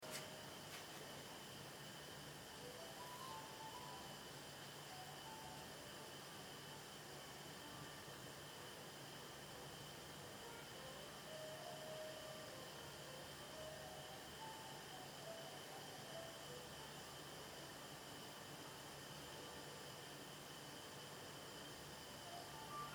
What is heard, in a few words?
Ring